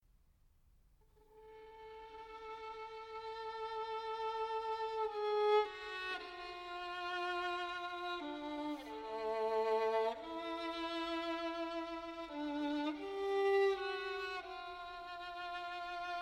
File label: String Quartet No. 2 III. Lento